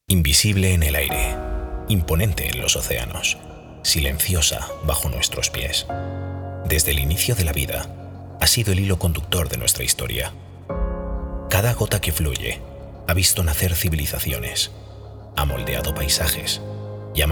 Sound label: jingle